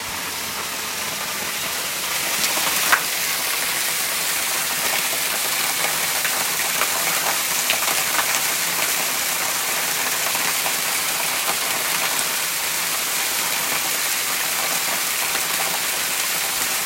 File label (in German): Wasserdusche live